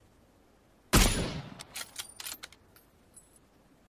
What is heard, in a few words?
Suppressed Kar